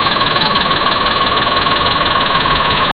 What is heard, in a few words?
a car engine failing to, cranking